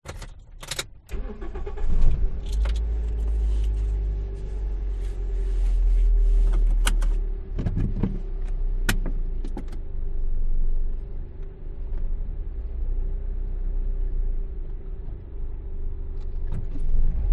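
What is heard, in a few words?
vehicles car internal ambience start gears rev away binaural toyota corolla; zapsplat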